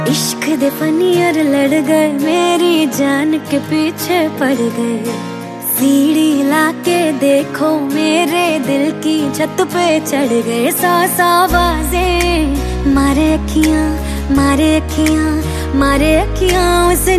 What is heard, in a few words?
Hindi Female Ringtone; ringtones